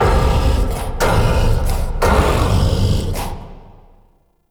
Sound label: Start Wave; Warframe; Entrati; Mirror Defense